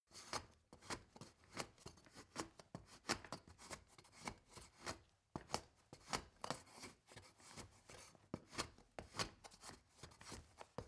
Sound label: step ladder wood scrape; schritte